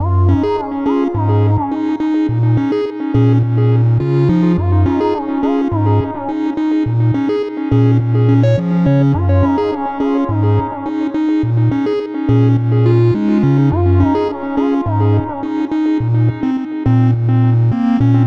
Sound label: songstarter 105 Bbm, Pop; Slate; SD PASADENA Pop Sample Pack